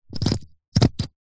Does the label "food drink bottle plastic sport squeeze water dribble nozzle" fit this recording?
Yes